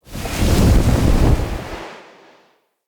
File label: Casting Device Projectile Whizz By Heavy; Galene